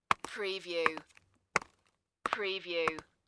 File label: Footstep High Heel